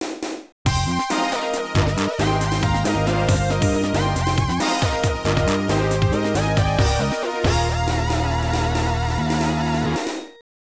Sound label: Player Win; J.League Soccer Prime Goal EX; Namco System 11; arcade